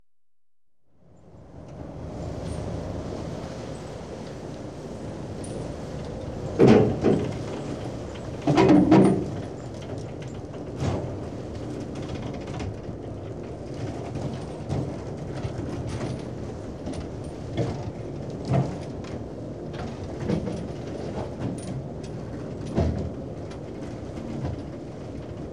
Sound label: Beam Fishing Trawler, Catch Being; Ships And Boats; BBC Sound Effects Library; Ships And Boats 1